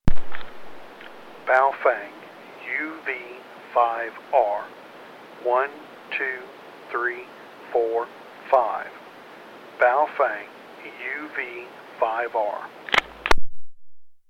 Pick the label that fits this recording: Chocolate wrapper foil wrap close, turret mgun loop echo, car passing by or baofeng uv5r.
baofeng uv5r